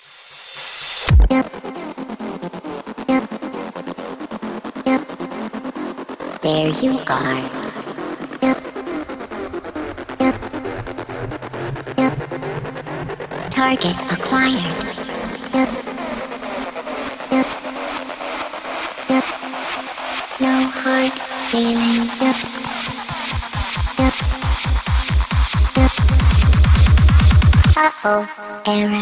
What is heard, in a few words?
turret error; electronic